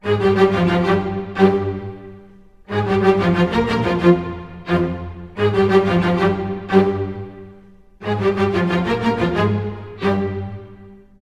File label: 90 f min strings